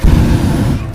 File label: noise